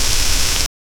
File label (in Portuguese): um som de lava subindo